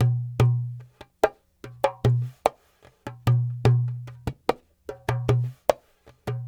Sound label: Dumbek